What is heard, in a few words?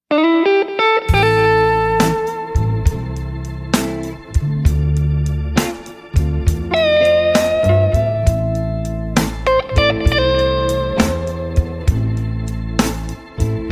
Blues